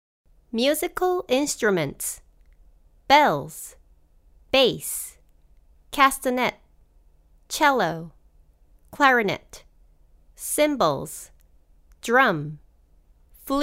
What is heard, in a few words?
Musical Instruments